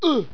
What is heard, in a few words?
scream